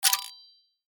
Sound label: mines start game; roobet